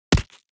BOW hits

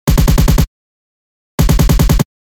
Assault rifle

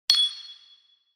UI moving option to one anoter

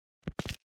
grabbing

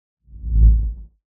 bass whoosh

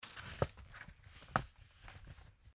Cloth movment

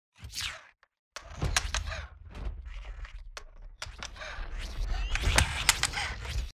ROBOT SMALL

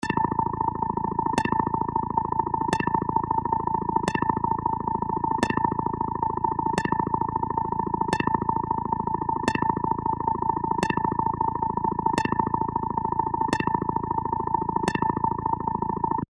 Scanning

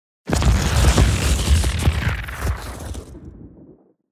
Thunder element

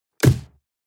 unleash arrow

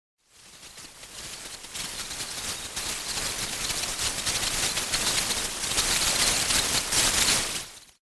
SHORT CIRCUIT

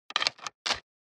Reading bow

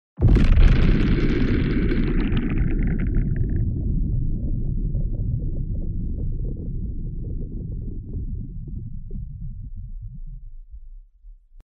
under water explosion

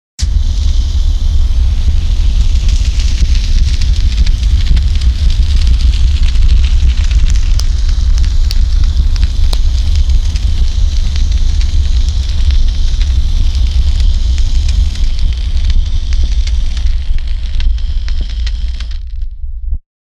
fire narrow

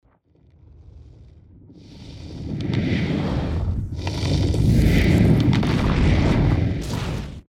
Fire element spell

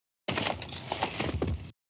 fall down

